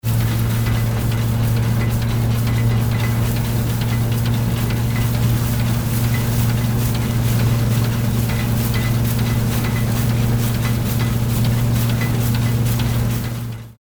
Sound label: steam threshing machine